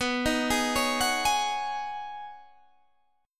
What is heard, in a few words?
B 6th Add 9th B down Guitar Open G